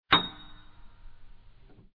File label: Click Normal; summersports